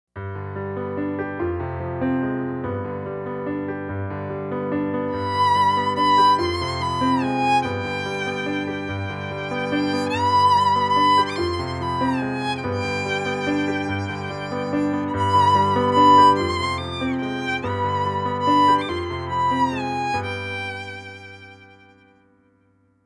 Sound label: Violin Wail; Mazon; Out West Orchestra